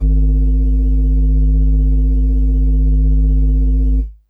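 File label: Pan Flute DX100 G#2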